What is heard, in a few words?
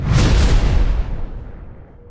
meteor slash